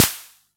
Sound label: SD B; Olson XX-100